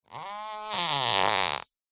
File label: human fart squeezed louder at end; zapsplat